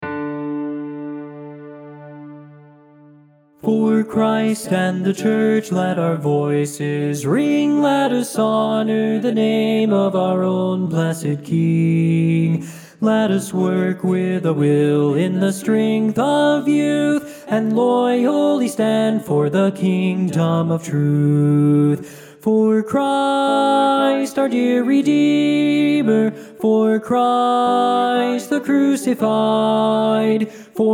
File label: LEAD